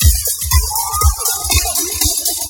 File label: 120 DISCO; USB Soundscan vol.20; Fresh Disco House I; AKAI; DISCO ADD